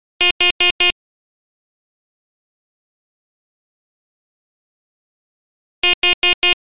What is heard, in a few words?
co alarm